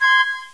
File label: pipe